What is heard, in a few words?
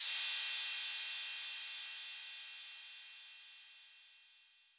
Cymbal 808 Decay, Clean Digital